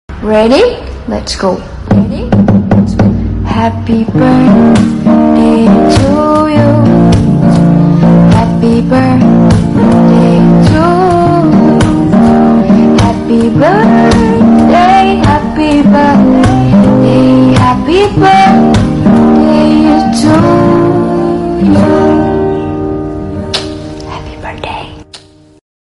lovely birthday musical tone ringtone

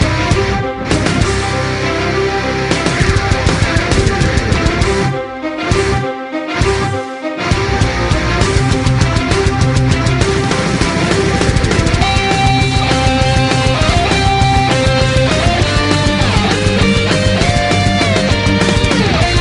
metal ringtone

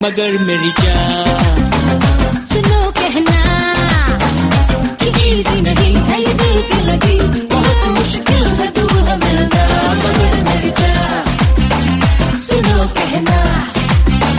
romantic song tone ringtone, bollywood

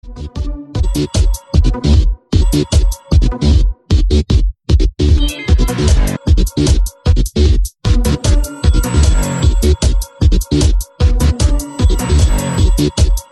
google pixel ringtone

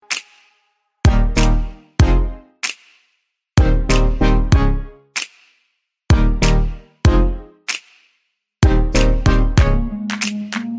bubble iphone ringtone

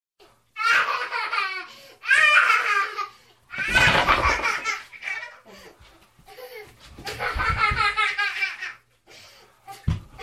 little girl laugh ringtone, funny ringtones

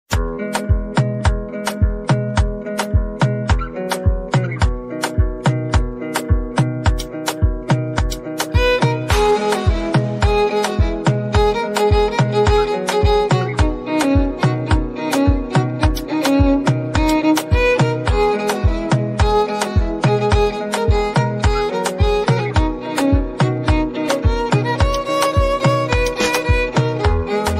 violin cover ringtone instrumental

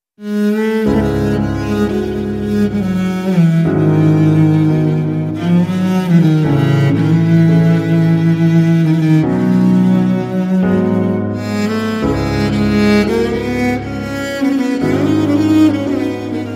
instrumental ringtone

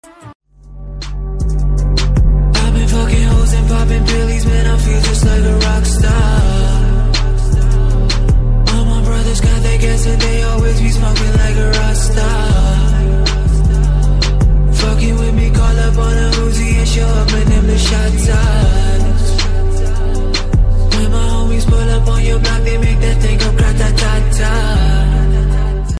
english music cool ringtone

